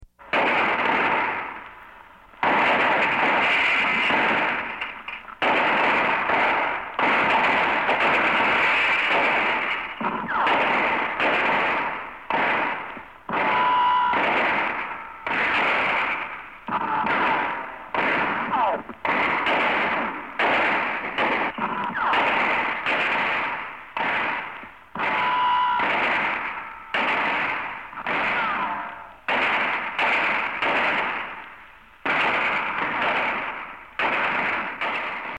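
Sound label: wild west sounds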